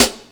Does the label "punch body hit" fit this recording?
No